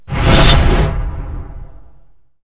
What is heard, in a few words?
menu; unreal tournament 2004; fav UT sounds